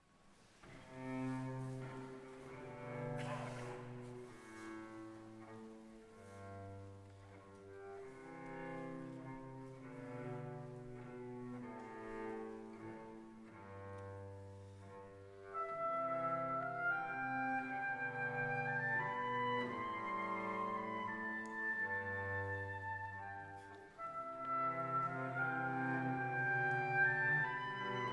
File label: Oboe Concerto in C live concert, Orchester; Mozart; live concert in st Peters Zurich